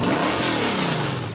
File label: start motor; BALLY